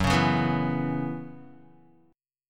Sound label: Gb 7th Suspended 4th Gb down Guitar, chord